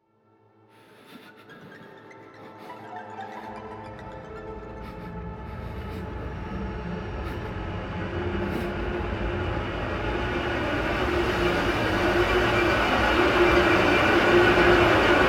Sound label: Horror atonal ambience